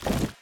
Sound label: movement step; smite; god odin